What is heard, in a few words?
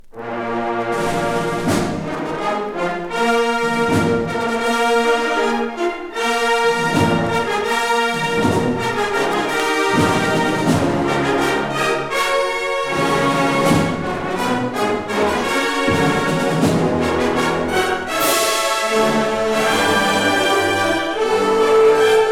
Fanfare; Fanfare From The Patriots